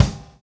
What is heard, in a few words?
Cell Kick; Sonic Foundry (Sony Creative Software); Drums & Drones; Construction Kits